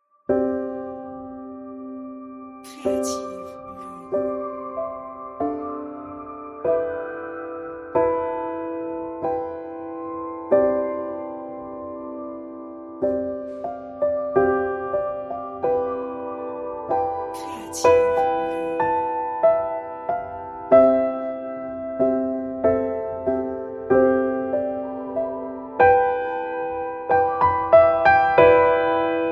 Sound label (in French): piano effets